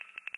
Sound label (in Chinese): 连接音效